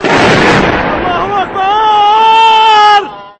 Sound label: hit